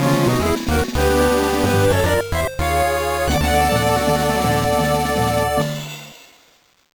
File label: Unknown Jingle; Sonic Advance 2